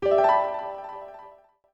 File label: Sound Sting; Alliance Leicester; BBC Good Homes